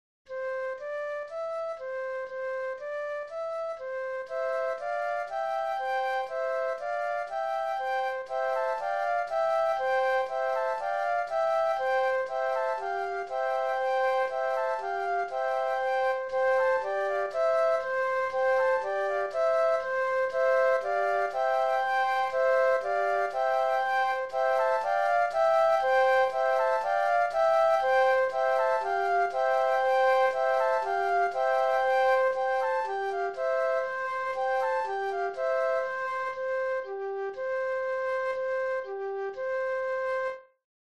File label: Flute Trio